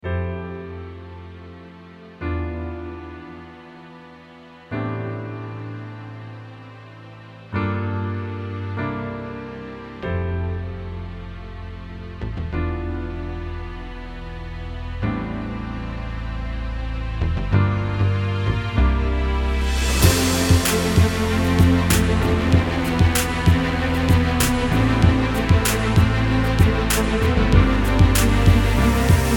Cinematic Hip Hop

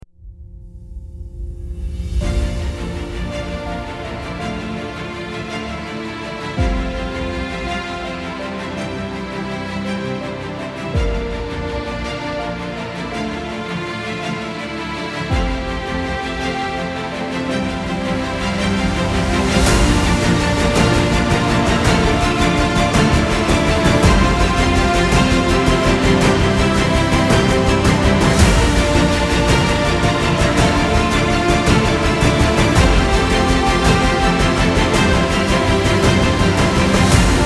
Epic History

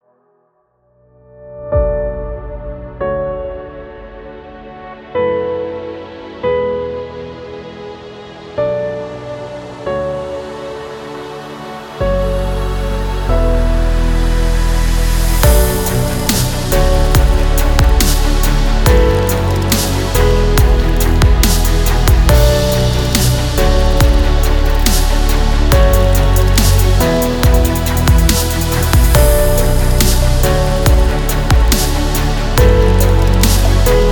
abstract cinematic

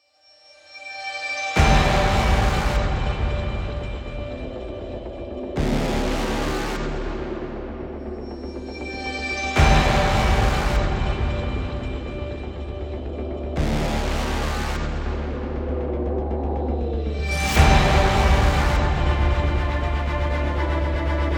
Heroic Dubstep

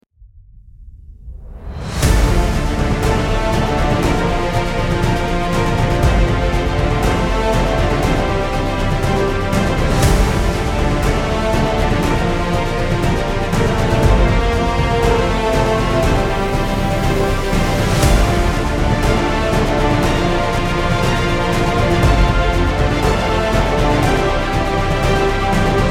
Dramatic Adventure

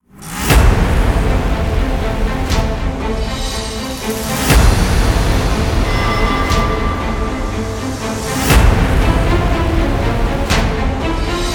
Hybrid Logo

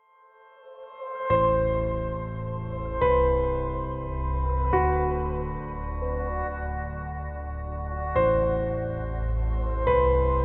Inspirational Technology

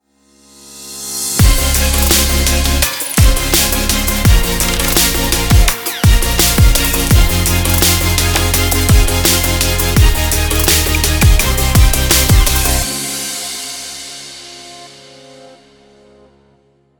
Urban Future Bass

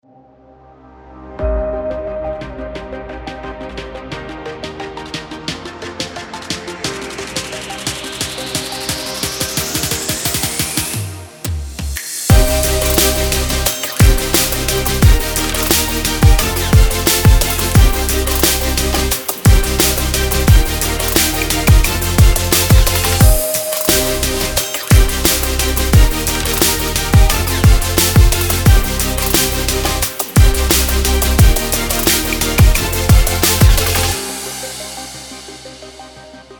sport motivation

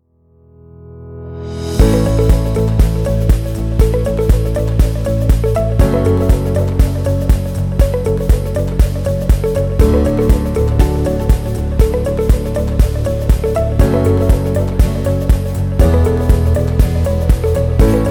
Inspirational

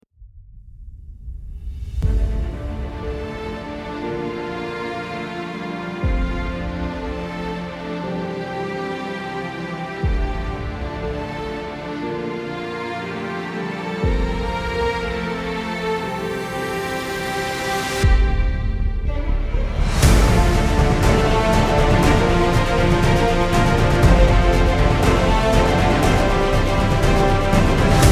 Dramatic Adventure

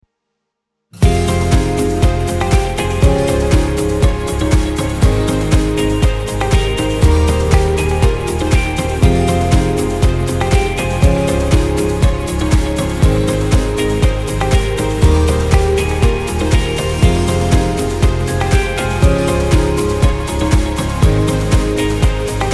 Upbeat Background

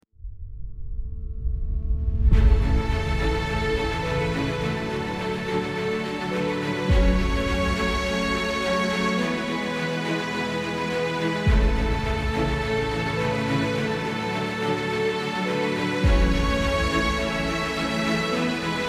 Inspiring

